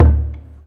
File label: Pacific Island Drum